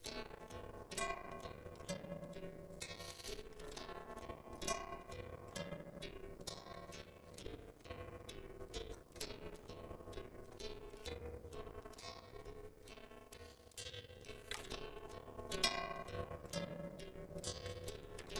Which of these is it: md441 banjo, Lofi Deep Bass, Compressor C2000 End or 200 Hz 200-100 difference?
200 Hz 200-100 difference